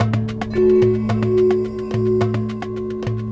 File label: Dumbek Tone, Drums; Sonic Foundry (Sony Creative Software); Friendly Fire; Marc Steve